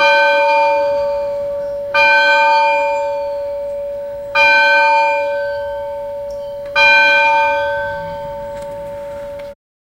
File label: medieval church bells sound